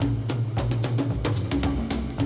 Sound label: 110, Classic Drum Loops